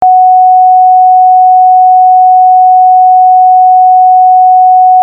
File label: Tone